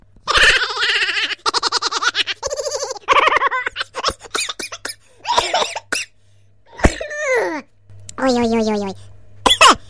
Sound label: hahaha